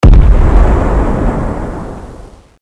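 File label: uav explosion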